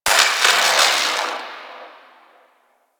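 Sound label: Distant Electricity; Warframe; Corpus Gas City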